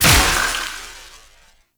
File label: Explosion; Warframe; Moa Pet; Multron